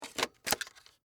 HD Camera Insert, Hd, Camera, Insert, Battery, Sony, Hvr-Z5; Camera Sound Effects; BlastWave